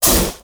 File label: preattack; dota; dark seer